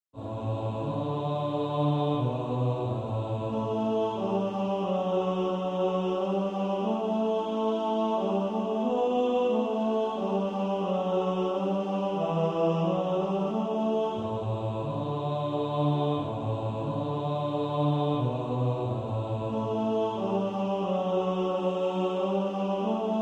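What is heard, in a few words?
bass, practice music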